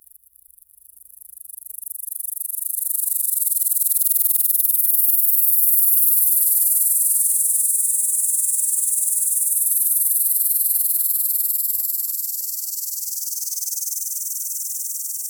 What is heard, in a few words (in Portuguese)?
pequenas criaturas orgnic; alien